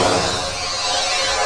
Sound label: Electro FX; Ueb HE Electro FX